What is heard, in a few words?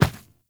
Medium Run Default, Footsteps; Enemies; Warframe